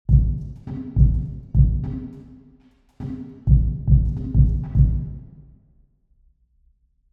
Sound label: drum, church; St Matthews church walsall